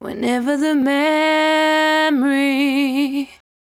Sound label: 74-5bpm B, Velvet Vocal; While U Could; Zero-G